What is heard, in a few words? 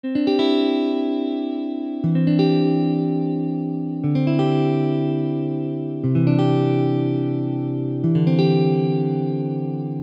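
chord inversion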